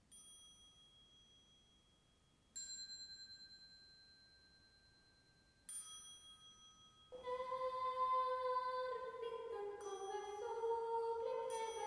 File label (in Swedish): vintern instrument; kyrkokonserter